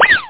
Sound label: wheel; penguins